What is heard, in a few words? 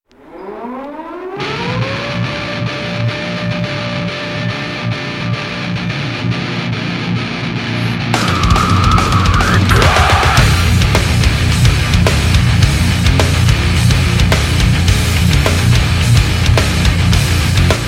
Battle Metal